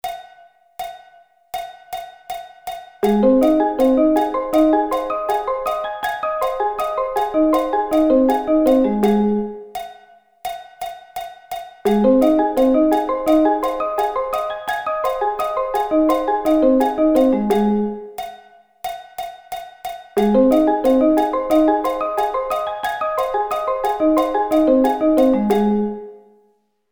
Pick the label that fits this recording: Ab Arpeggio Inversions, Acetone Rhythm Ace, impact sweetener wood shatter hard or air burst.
Ab Arpeggio Inversions